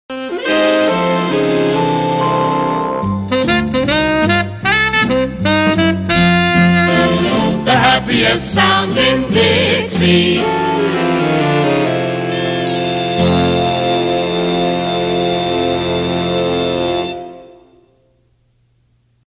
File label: Theme and Logo Reeds; WBAG